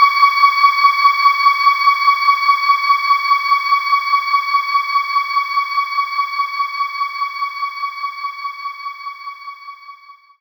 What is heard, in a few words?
Ooh Voice C3; knights try